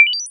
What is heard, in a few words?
ui unlock clean